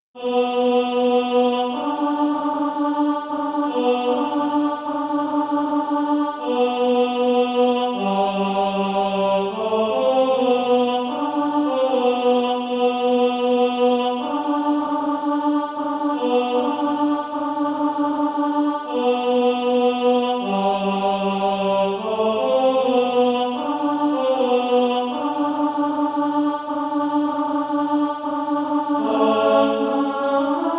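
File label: tenor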